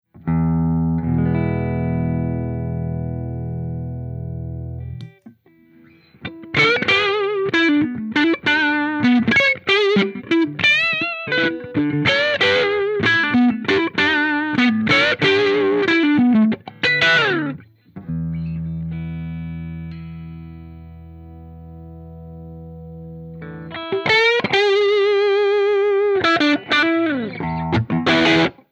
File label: Les Paul Marshall 2203; Honk Machine